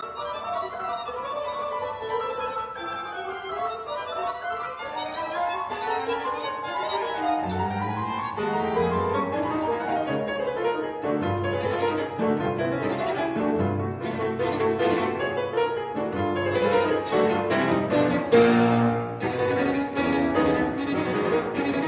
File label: Passacaglia and Fugue; Op 87; Mirecourt Trio